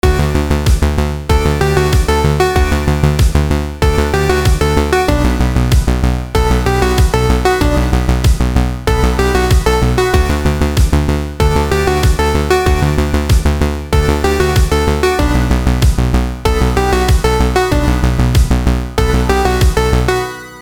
retro

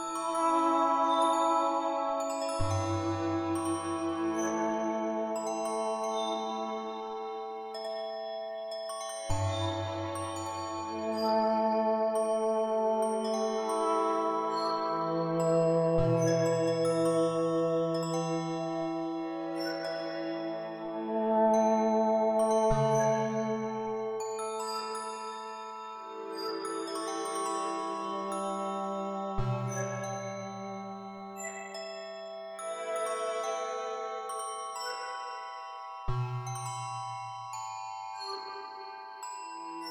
ambient

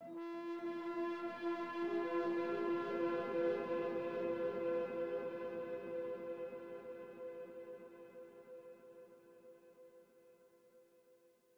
Ambient

